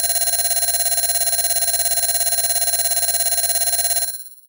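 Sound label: Alarm Call DX100 C#6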